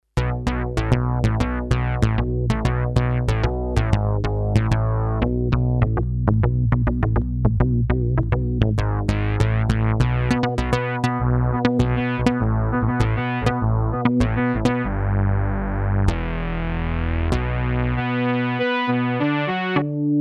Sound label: 2600 Saw, M-Audio Venom